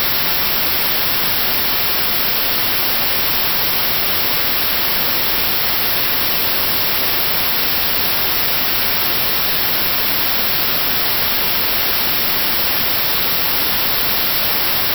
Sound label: notched white noise; binaural sound therapy for tinnitus relief